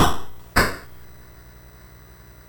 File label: BASIC sid MOS6581R4, GAMES; Sword of Fargoal